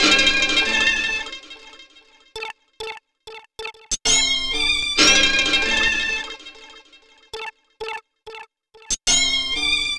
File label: Melody, Hip-Hop